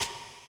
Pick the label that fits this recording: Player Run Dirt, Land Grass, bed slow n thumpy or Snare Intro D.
Snare Intro D